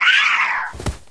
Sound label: chimpanzee dead, monster